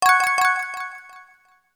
ALERT

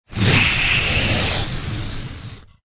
ANIMAL CREATURE

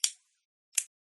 lighter zippo click

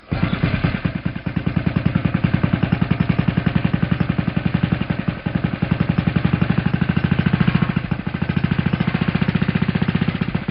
fishing boat start

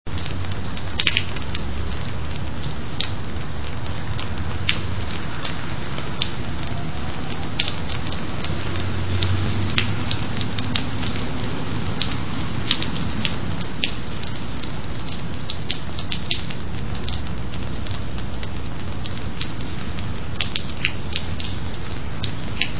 rain

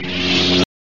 lightsaber turn on

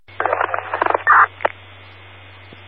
police scanner; scanman

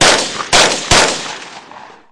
p90 machine gun

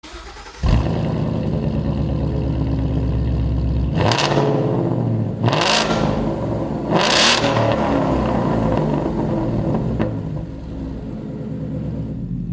ford mustang gt

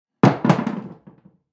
Object Drop

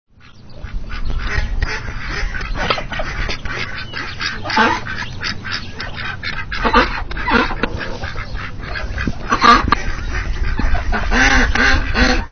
ducks

